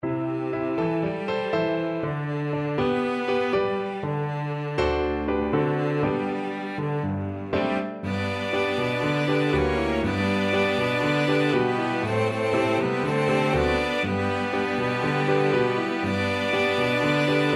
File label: flexible ensemble and piano 3 players and piano